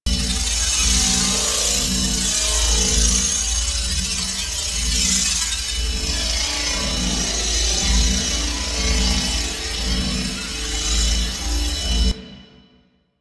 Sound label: Sabotage Electric Pulse Loop; Veilbreaker; Devourer Kahl